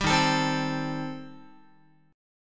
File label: Eb 6th Flat 5th, Guitar; Eb6b5 strummed; JGuitar